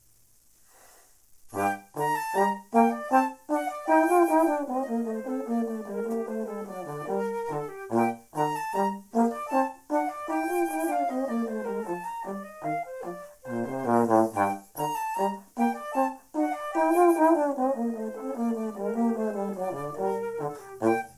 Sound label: Gavotte; classical-duo; Bach